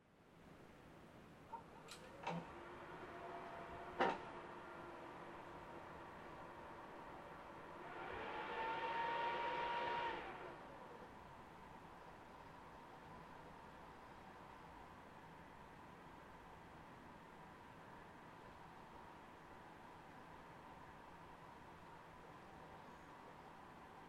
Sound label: PC Operating Noise Far On Off